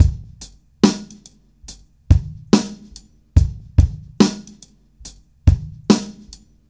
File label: funkiness, drum loops